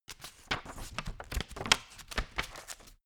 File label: turning page